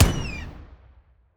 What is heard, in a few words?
seagull bomb